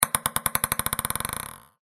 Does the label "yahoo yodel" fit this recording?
No